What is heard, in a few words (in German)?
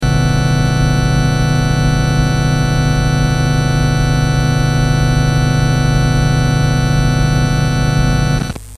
tongenerator hammond ton 91; Hammond B-2